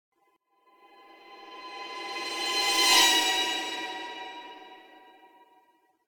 Impact Metallic Medium Squeaky Impact - Passing, Semitone; Hollywood Edge; sound designer's toolkit v2